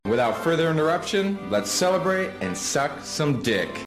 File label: celebrate